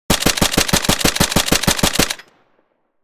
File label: AR10 machine gun firing short; guns